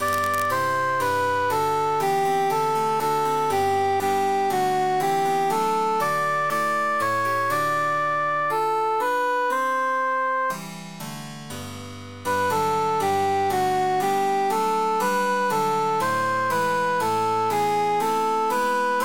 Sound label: cantus emphasized